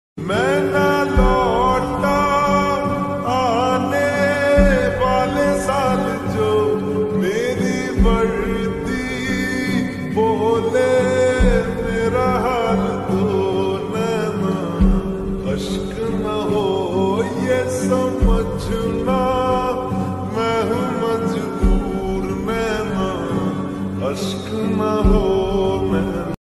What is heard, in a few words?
Lofi slowed reverb